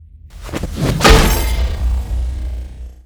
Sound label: Get Hit By Bat; Lucius III; pc linux